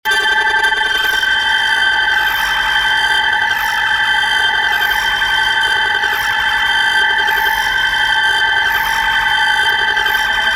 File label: silicon cicadas